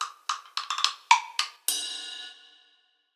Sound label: Band Hit 8 Percussion Jingle; Ed Edd n Eddy; Adromulus Pack